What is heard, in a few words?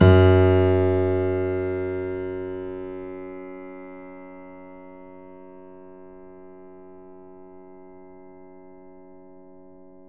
Grand Piano F#1 f; Ableton Live 9 Essential Training